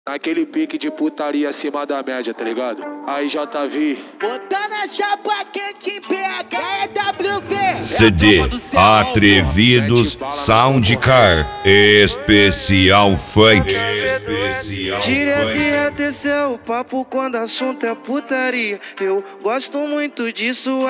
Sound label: funk